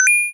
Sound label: arcade coin